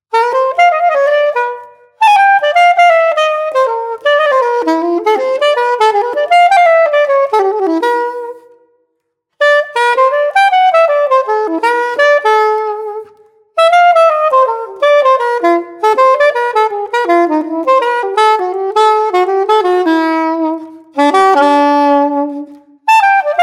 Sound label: Jazz